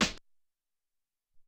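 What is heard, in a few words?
Hop Snare, Alesis SR-18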